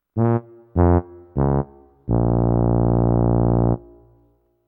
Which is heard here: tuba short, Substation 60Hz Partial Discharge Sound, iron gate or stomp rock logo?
tuba short